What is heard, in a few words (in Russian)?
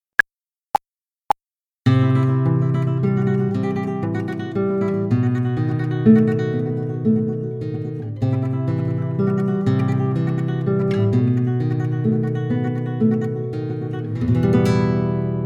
Uprazhnenie Tremolo na gitare